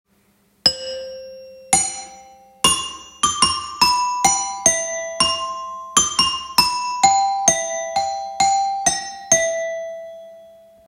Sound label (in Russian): metallofon